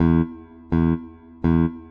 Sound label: loop bs 125